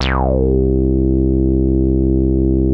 WET KISS; Masterbits; Vintage Voltage; SQ+FX

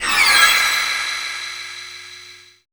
FLY-BY; Spectrasonic Distorted Reality 1

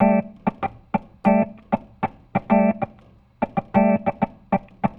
Organ, 70s Analog Funk; Sonic Foundry (Sony Creative Software); What it Is!; Slinky's Place

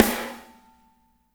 SNARES; STAIGHT

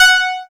SYN, Microwave; Sound & Vision - Gigapack I CD 2

